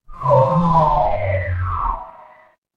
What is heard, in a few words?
transition sweep